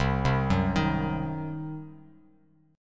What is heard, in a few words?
C Diminished C, Bass Standard; arpeggiated; JGuitar